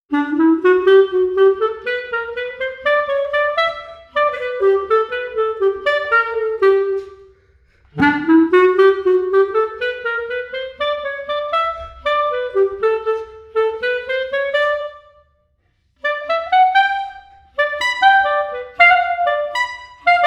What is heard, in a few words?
Clarinet; Valiant Hearts Coming Home; James' Clarinet